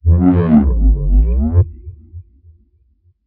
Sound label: Gravity Anomaly Charge; we are the dwarves gamerip 2016